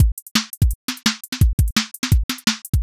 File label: old school jungle breakbeat 170bpm 2 bars